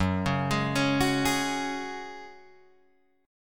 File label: Gb Suspended 2nd, Guitar Drop D